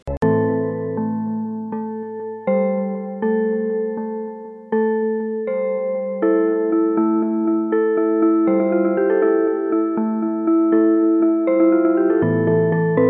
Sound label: Eerie Lullaby; The Beauty of Noise